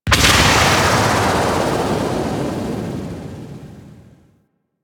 Exhaust Trail Cast; DE; Warframe; Weapons; Archwing; Powers

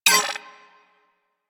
Cipher Enter, UI; Warframe

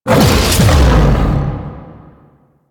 Howl Cast; Warframe; Voruna

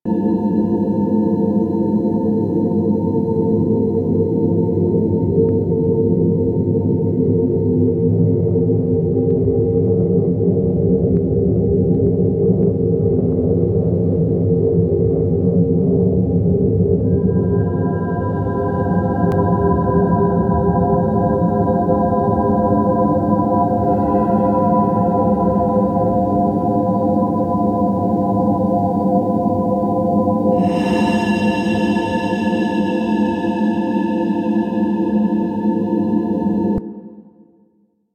Shimmer Drone B; Ambience; Interior; Warframe; Orokin

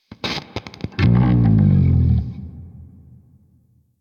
Sentient Shawzin Equip

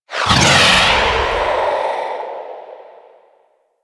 Switch Ability End; Warframe; Animus; Anima Animus

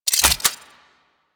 Thanotech Pistol Reload; Warframe

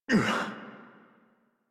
Pain Adult; Warframe; New War; Adult Operator

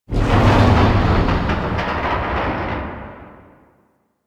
Void Dragon Move Short; Warframe; Duviri

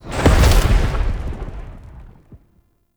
Spawn Fall; Enemy; Nimrod; Abilities

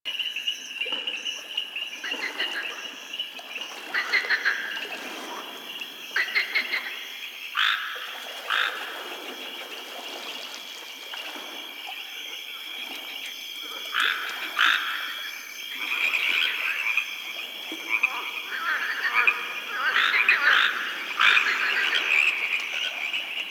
Exterior Lake Critter Loop; Warframe; Duviri

